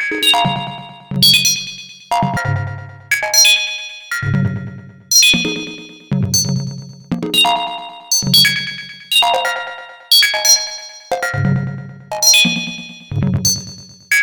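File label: modular synth sequenced hypno techno 135 f minor; synth loops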